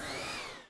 Air, Train; OpenBVE

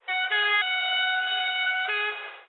klaxon, Train; OpenBVE; UM

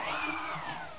air, Train; OpenBVE

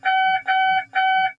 Adjust, Train; OpenBVE

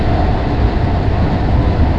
Run 2, train; nankai6000; OpenBVE

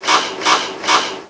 Set Back, Train cl158; OpenBVE

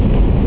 spring, R62 Kawasaki, Train; OpenBVE

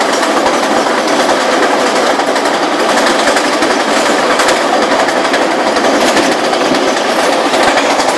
RUN, Train, R62 Kawasaki; OpenBVE

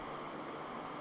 Loop, Train; LT1938; OpenBVE

